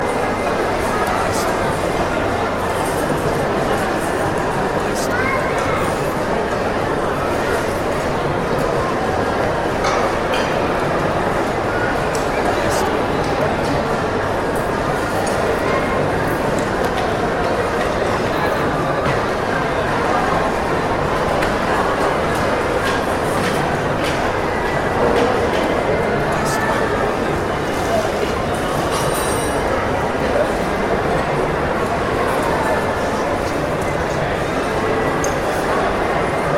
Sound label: food court